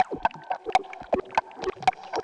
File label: eurorack